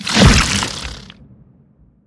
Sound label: spitter death behemoth, creatures